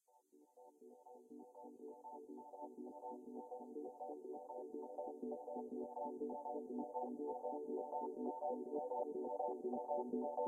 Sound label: Synth Theme